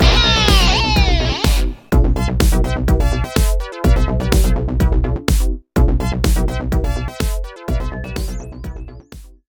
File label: Jingle Win; gamerip; The Next Penelope